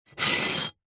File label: stone or flat rock single knife blade scrape scratch; foley; zapsplat